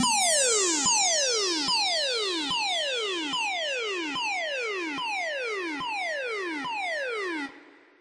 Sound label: space invaders zwoopdown; Electro and Synthetic